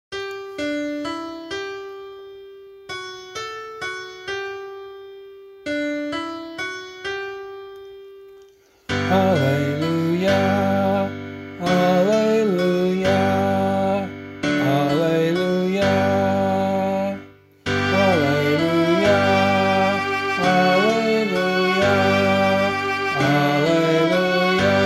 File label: Gospel vocal